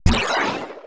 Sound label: Sci-fi Pulse Gun Blast